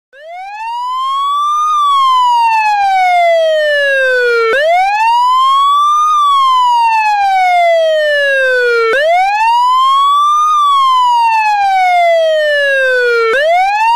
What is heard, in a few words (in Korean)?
효과음 엠블런스 소리